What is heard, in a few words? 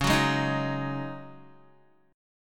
Db Suspended 2nd Suspended 4th Db Guitar; strummed; 9,9,6,8,6,x; 4E,4A,5D,5G,5C,6F; Dbsus2sus4